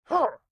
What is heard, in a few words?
Mosskin Attack